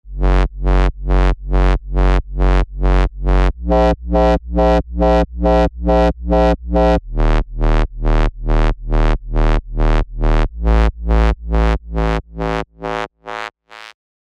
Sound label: Bassline UK; alonso 19 year anniversary pack